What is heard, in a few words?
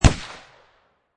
Pistol Rhuger 357 Single Shot Designed; Gun / Pistol: Rhuger 357, Single shot, Designed.